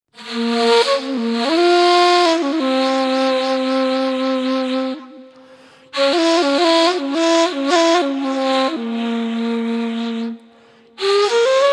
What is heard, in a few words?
Turkish Kaval C